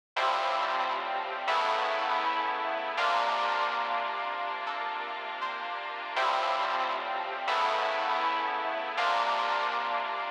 Stygian Scale

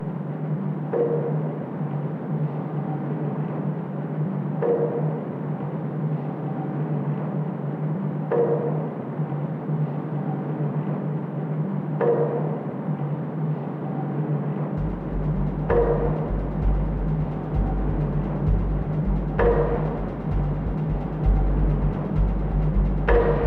Blues; Cablecar

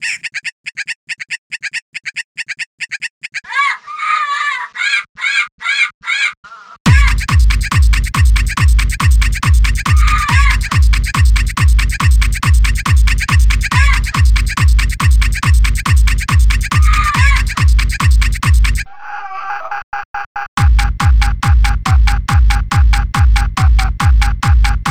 lil animals boi type beat

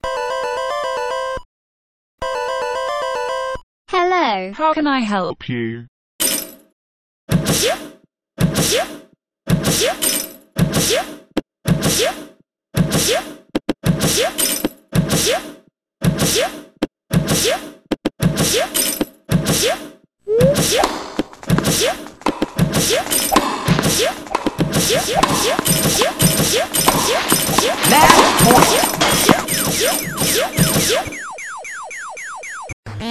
game over

